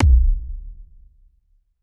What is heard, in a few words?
kick verb